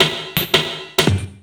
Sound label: LOOP SD, 168 BPM, Drum & Bass; Ueberschall; Drum & Bass Flash Trackz